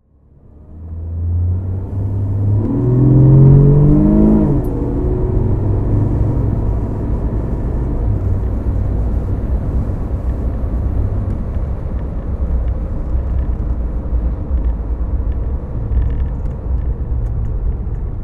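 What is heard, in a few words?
jaguar xjc v12 driving, Engine sound driving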